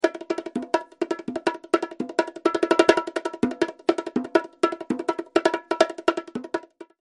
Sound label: upbeat bongo rhythm